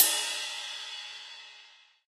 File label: drum hitwhistle; osu!